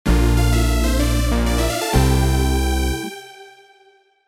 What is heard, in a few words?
Jingle Bath End